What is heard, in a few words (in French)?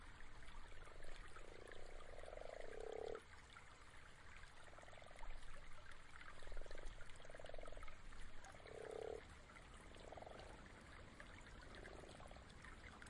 grenouille rousse